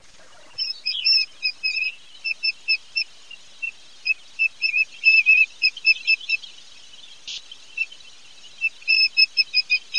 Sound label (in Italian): TOPO ARVICOLA TERRESTRE